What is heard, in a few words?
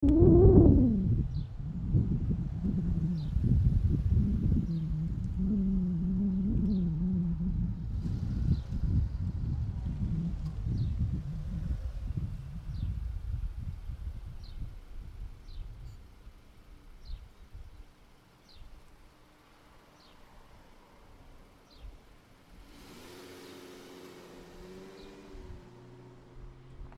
BIRDS TRAFFIC; HUDSON